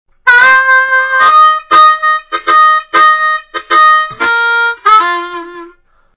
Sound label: Pull Slap Bb Slow, harmonica